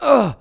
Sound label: grunt Work, working People